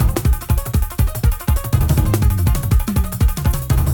music free spin intro loop; serengetikings

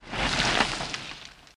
Arm Lift Drop; wild worlds

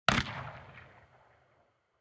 ambience gun; dead or alive 2

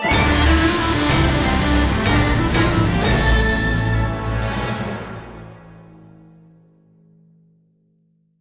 intro Free Spin; warlords

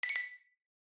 extra Spin; willyshotchillies

